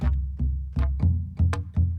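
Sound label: Calm Far, music; from dust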